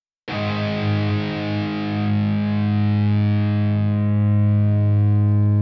Guitar

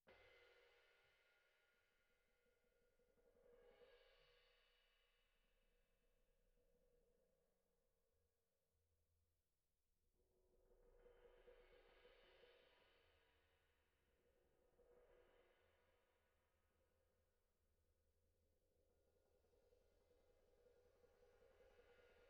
BD In

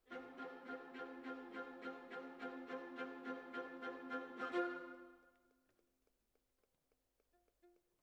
Cellos

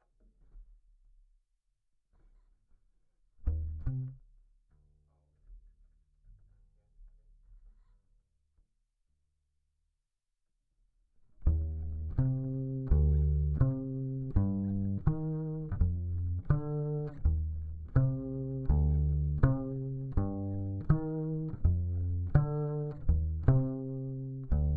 Bass DI

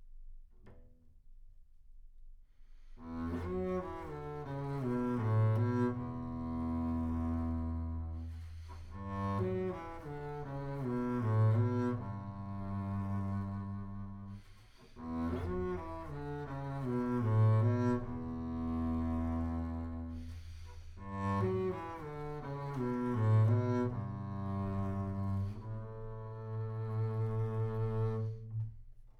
Bass Room

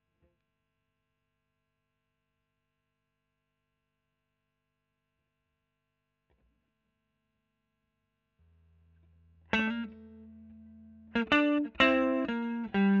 E.GTR Royer Solo

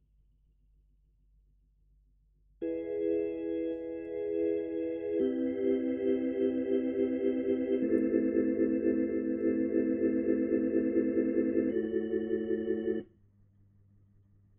Hammond

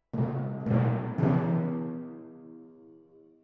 Timp

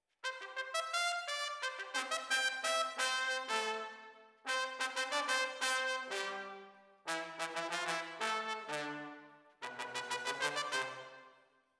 ORTF, Jazz for piano

